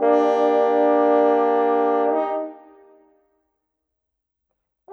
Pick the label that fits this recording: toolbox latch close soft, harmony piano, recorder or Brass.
Brass